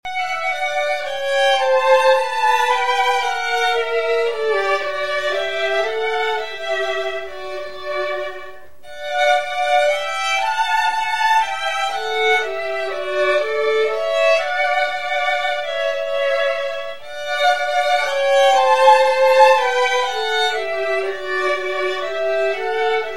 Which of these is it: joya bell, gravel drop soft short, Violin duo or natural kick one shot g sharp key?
Violin duo